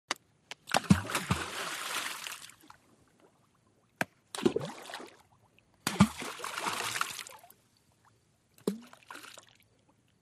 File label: Splash Boulder Rocks